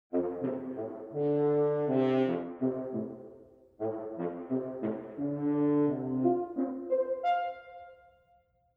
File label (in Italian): corno francese